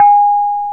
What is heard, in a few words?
reyong mid, gamelan; gravityharps